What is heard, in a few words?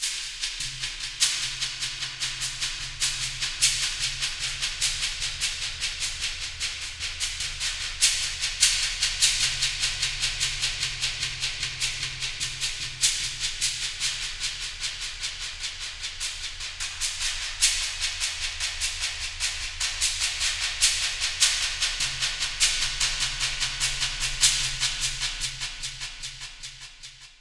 Forest Perc